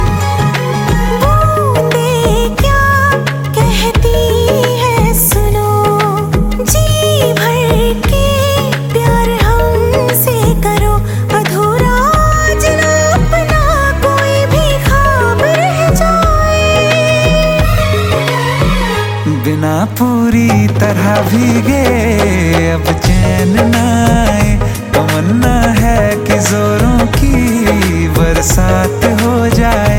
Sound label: female version